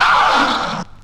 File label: "Ahhh"